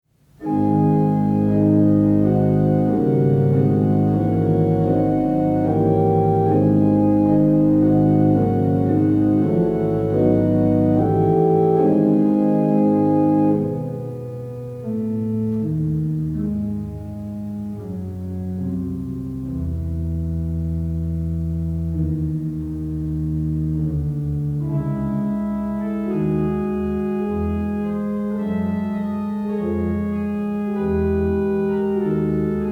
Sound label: French Organ Music; vierne